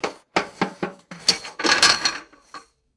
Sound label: metal bucket set down with handle rattle; foley